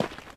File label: dirt step; Golden Crayon Sounds